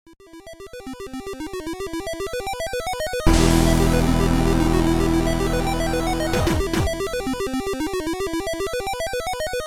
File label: music final boss intro; Skellboy